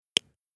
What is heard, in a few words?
scale